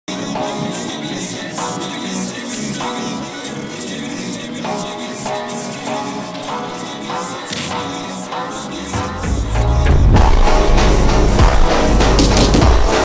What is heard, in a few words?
phonk